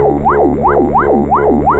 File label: ball; pod skyrace